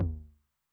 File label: Low Tune Long Decay, 909, MID TOM; classic machines; 909mod; MT - MID TOM